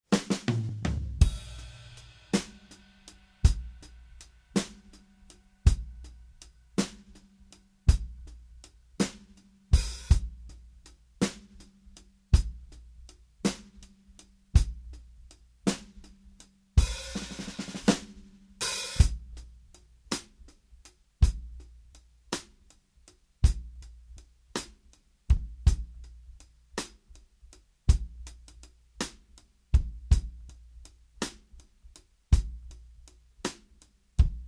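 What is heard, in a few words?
70s soul, full mix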